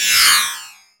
transform, magic; huashu design; sfx

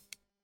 card snap; huashu design; sfx

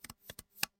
stack collapse; huashu design; sfx

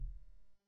drop thud; impact; huashu design; sfx

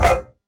whoosh fast; transition; huashu design; sfx

